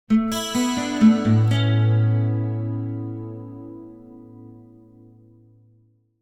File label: Acoustic Guitar - Music Stinger - Picked With Chorus; Hollywood Edge; sound designer's toolkit v2